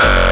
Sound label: pure hardcore kick; Kicks; single hits